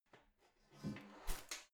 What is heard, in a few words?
garbage can close lid soft